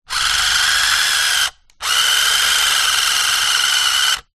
leisure radio controlled car rev free wheel wheels in air; zapsplat